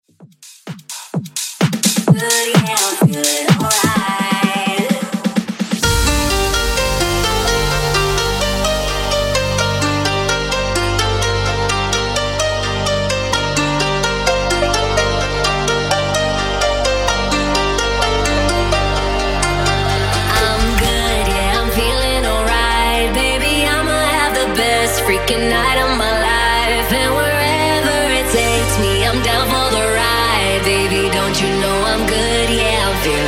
Top40 Tech House

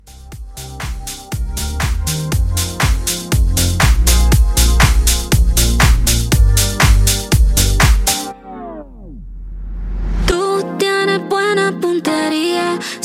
House Dance Re Drum 120

